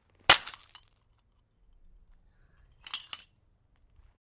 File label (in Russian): butylka vina